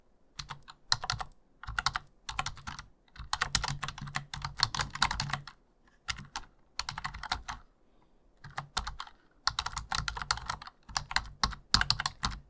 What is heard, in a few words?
type in